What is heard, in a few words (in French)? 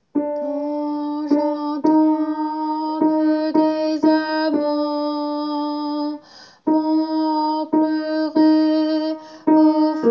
Quand j'entends alto